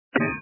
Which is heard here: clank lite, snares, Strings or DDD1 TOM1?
clank lite